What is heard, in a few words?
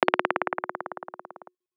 echoes